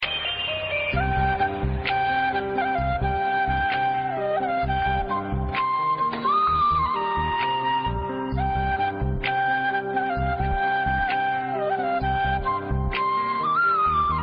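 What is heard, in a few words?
background music Tamil Ringtone; Saravanan Meenakshi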